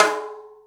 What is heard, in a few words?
SN, drum machines